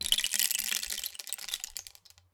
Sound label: Rain Stick, Percussion Samples